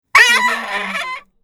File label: screeching faucet twist